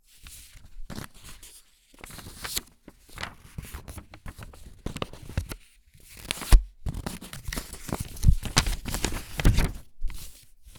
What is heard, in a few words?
turn paper small